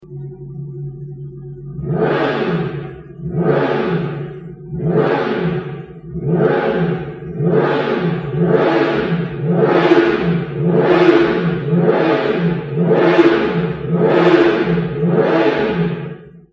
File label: r6 static